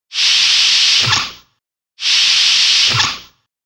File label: swoosh long; TOS